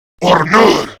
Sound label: Surprised Grineer Male; Warframe; Battle Barks